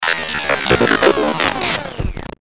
Glitch Power Down Buzz, Glitch Interference Burst Reverb; Production Element Imaging Element Accent Transition; Blastwave